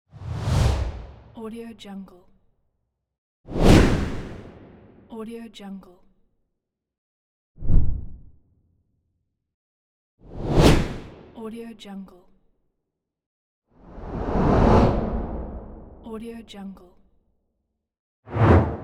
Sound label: whoosh